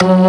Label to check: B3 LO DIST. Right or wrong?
Right